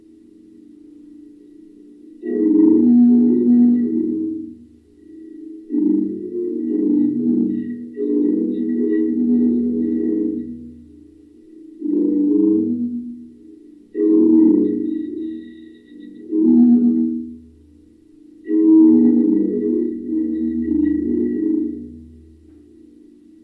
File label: Amazing Sound